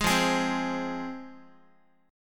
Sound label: F Minor, Guitar; strummed; 4A,4E,5A,5D,5Gsharp,6Csharp; 11,13,11,10,x,x; JGuitar